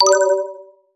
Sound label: ring